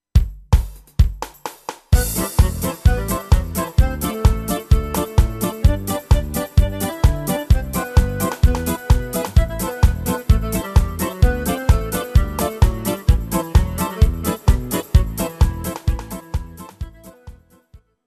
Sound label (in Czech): Romska lidova